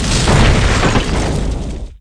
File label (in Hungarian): tank talalat; Pure Pinball